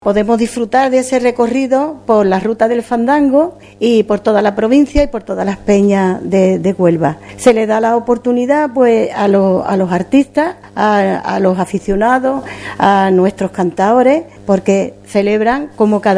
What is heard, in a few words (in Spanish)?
FLAMENCO; RUTA